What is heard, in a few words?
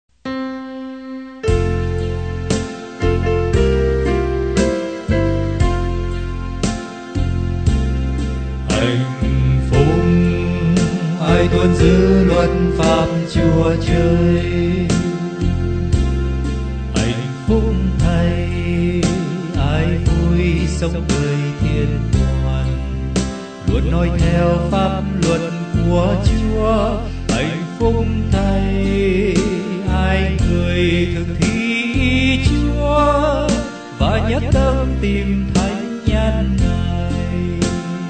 BASS